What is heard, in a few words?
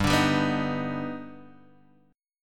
D sharp Augmented Major 7th G, chord